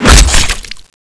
lance slash hit

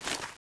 ng7 draw, weapons

